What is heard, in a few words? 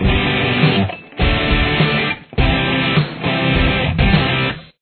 guitar; 1990s